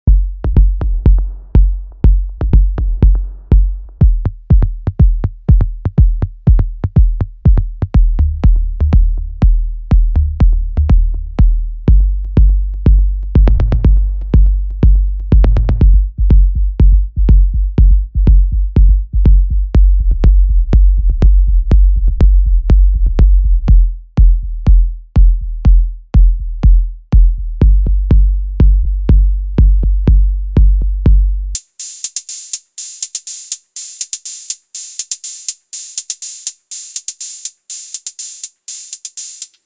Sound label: melodic techno; melodic techno production pack